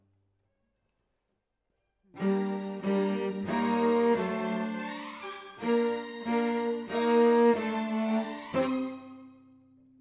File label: Cello Solo